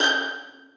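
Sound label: 2nd violins pizzicato g6; Sonatina Symphonic Orchestra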